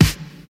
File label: acoustic snare sound g sharp, Royality free snare drum sample tuned to the G# note